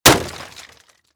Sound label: Impact Plastic Smash